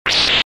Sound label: Whip Sound; Super Castlevania IV